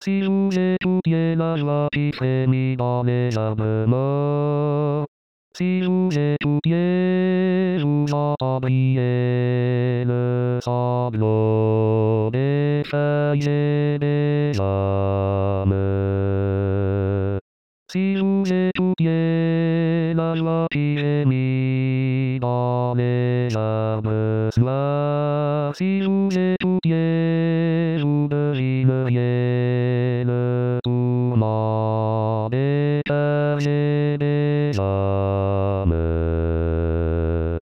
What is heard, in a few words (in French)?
basse; rimailleurs